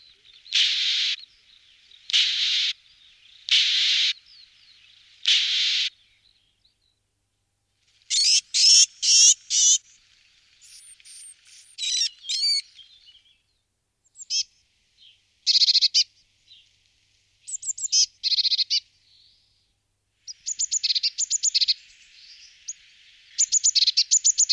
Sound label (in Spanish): Parus caeruleus - Herrerillo común